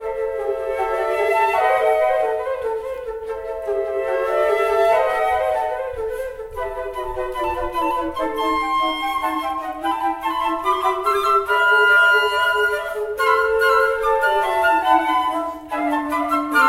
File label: Quartet